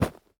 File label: snow land